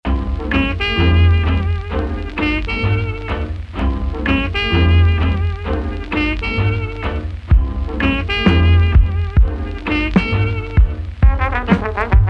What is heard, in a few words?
jazz